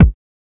bassy steel kick drum e key; tuned drums e key

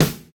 crisp acoustic snare sample e key; snare drum samples

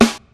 snare sound A#; snare drum samples

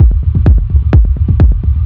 delayed verby clicky techno kick loop; reverb

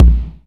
bass drum single hit a sharp key; kick drum samples

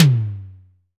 acoustic tom sound c sharp key

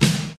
boomy steel snare drum e key

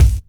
rich mid range kick drum sound a sharp key

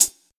dry hi hat a key

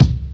old school good hip hop kick drum c key

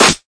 wet snare sample E key

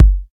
natural kickdrum sample g key

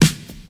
piercing acoustic snare sound e key; snare drum samples

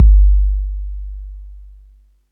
puffy kick drum sound g key

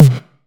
tom drum single hit b key

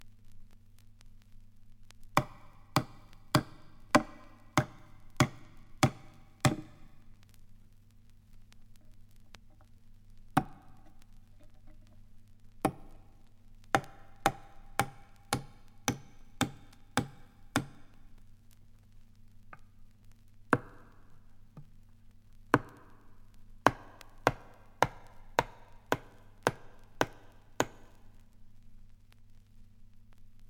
nailing coffin lid down - hammer hits

